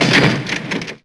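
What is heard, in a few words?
Door slam; PC Gamer IT CD 38; thief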